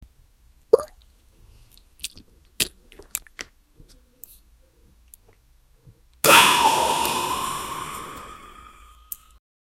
someone vaping, smoke

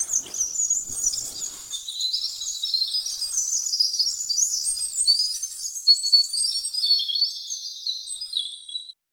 full life forest ambience; hopeful

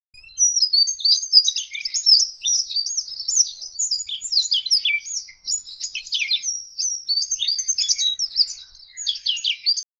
birds at first light, chirping

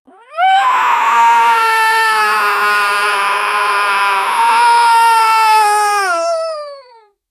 water zombie scream

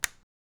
a soft mechanical click, switch

